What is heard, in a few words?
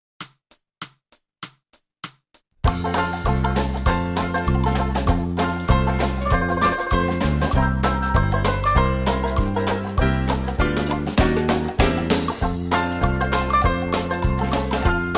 Trombone Rag base